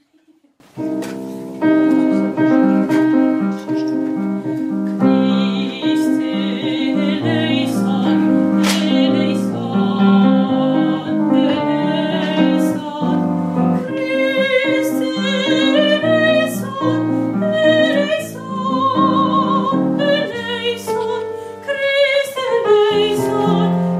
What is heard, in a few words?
tutti; christe